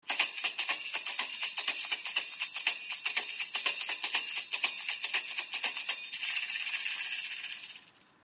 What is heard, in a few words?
Khartal